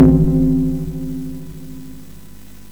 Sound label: Gong Sound, MOS6581R4; sid